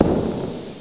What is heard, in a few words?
Gavel; Par Building